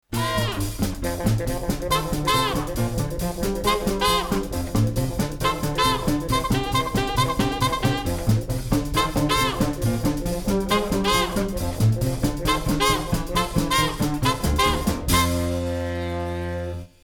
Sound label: music track dvd jingle; Ed Edd n Eddy